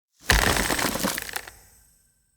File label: Fey Wild Snare Activate; Powers